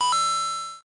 Coin, Notifications; Mario; Super Mario Bros

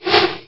Bowfire; WarCraft Orcs & Humans SFX

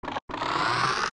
Chest Big; Zelda; OOT

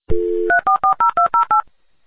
dialing, dialup; WIN31; dosbox

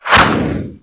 Catapult; WarCraft Orcs & Humans SFX

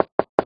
knock knock; ICQ; Notifications

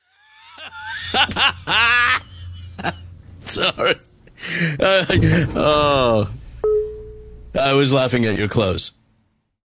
laughing clothes; Archer; Ringtones

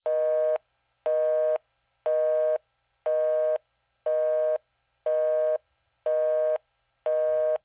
busy, dialup; WIN31; dosbox

